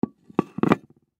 Dinner Plate Down, Dinner Plate Set Down Light Slow Table; Dishware; Household; Blastwave